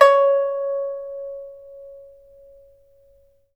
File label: PIPA C#6, Heart of Asia; Spectrasonics